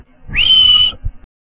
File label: beam